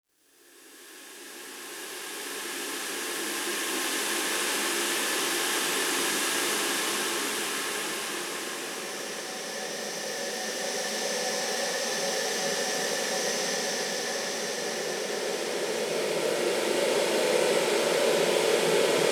Splashes River; Chalice Well

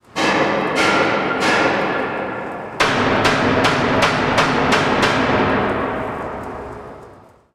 Hit, Earth & Steel

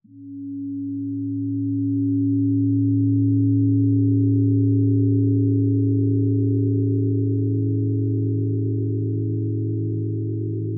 Gong; Raven

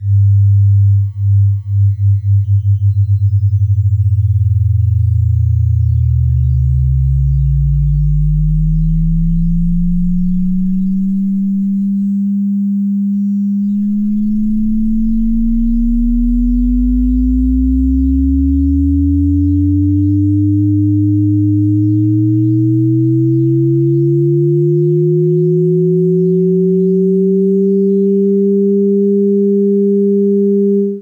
Ring Modulation

